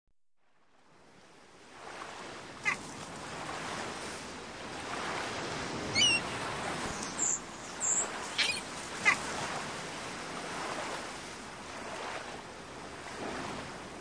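wave splash sea noise and close a couple of seagulls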